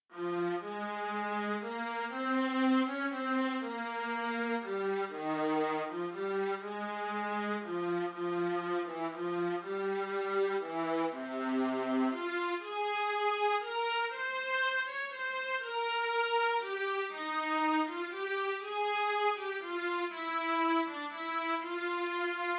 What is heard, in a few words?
alto greensleeves